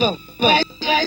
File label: Fx Vox; Equipped Music; Loungin House